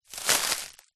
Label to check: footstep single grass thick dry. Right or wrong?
Right